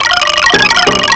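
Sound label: RHYTHM, PERCUSSION; CMI Sound Library Rev 1.3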